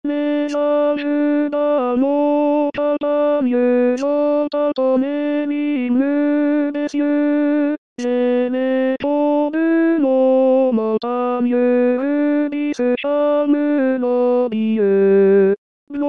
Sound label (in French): Tenor; LES ANGES DANS NOS CAMPAGNES